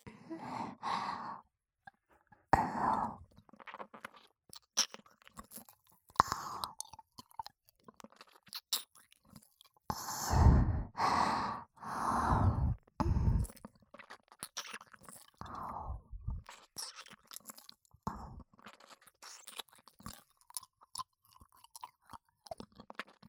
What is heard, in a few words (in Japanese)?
耳舐め; ディジー; おまけ音声